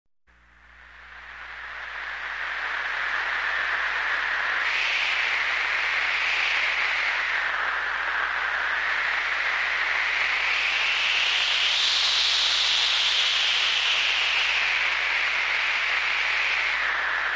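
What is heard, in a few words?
sound of blizzard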